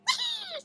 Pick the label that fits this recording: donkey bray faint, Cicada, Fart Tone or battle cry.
battle cry